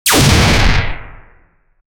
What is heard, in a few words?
sci-fi gun blaster